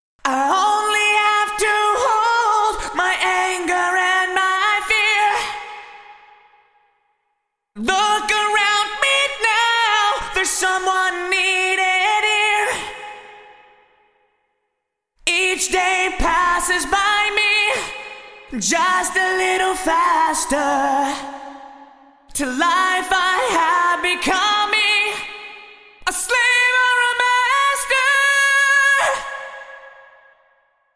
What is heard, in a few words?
Vocals Yamaha Rev7 Large Hall; Vintage Digital